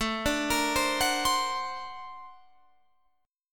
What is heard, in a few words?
Bb Augmented Major 9th A